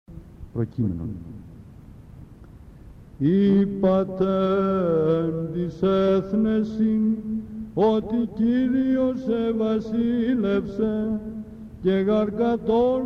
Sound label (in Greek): ΠΡΟΚΕΙΜΕΝΟΝ, Psaltichie; ΑΝΑΣΤΑΣΙΜΑΤΑΡΙΟ; ΗΧΟΙ